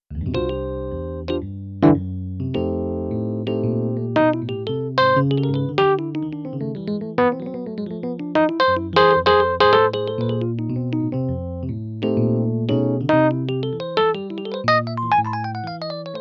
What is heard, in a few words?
Amp Drive EP, Korg Radias